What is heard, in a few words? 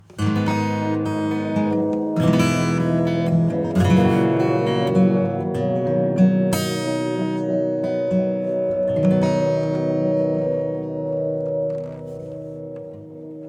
acoustic Long Lush; GEM Echoson; SPECIALS 100 WET